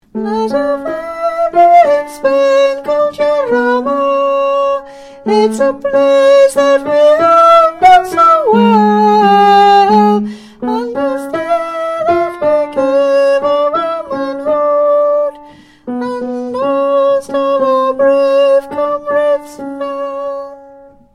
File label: Tenor